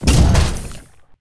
move, battlesuit; combat; CosmicRage

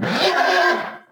hurt; combat; creatures; horse

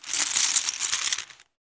poker chips; Cosmic Rage

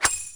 ring; Wear

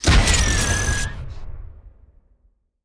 fire, rocket; combat; weapons

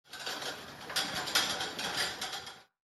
wheelchair

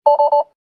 warn, vehicle

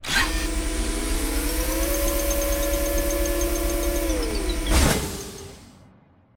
dock, ships; CosmicRage; CosmicRageSounds

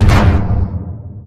boom out, combat, aircraft

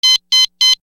recall, ships combat; CosmicRage

